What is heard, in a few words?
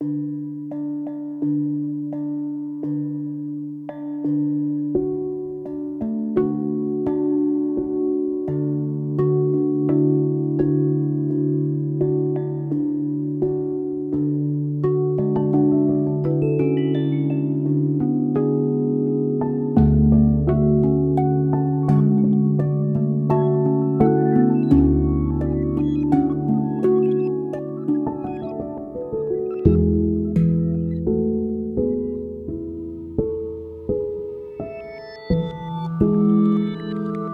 pan drum